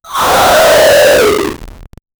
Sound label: 8 bit zombie get damage